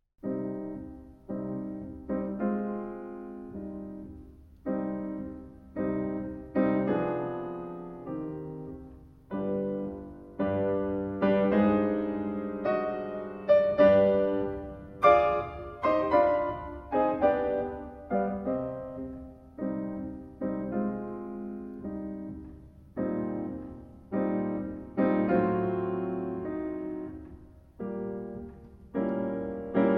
Konzertino in E-flat major, Marsch Funebre, Trombone